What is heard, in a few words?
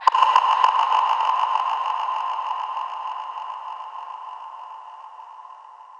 Delay Reveal; FREE SFX PACK VOL 3